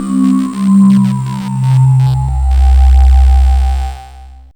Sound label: wave sweep C2, PPG wave; Zero-G Ambient 1